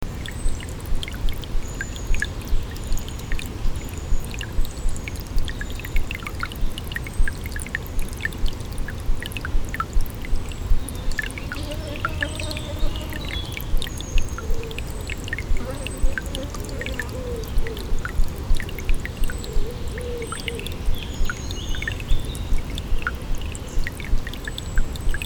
Devon Musical Stream

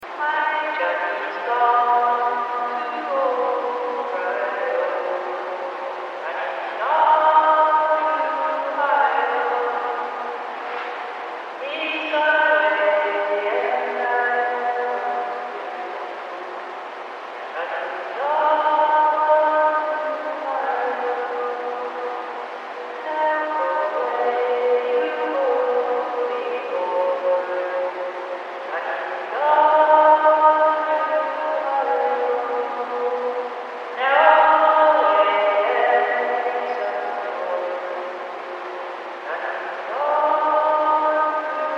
antarctica

